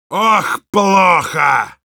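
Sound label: negative vocalization, Russian; Heavy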